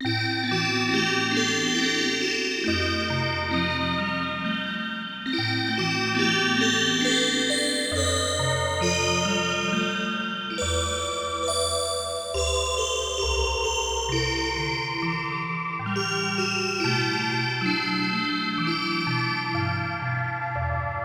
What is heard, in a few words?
Horror House Organ; Shin Megami Tensei Devil Summoner Soul Hackers; 3DS; Horror House Organ -Alt 5